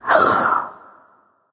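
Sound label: nonverbal surprise; smite; ratatoskr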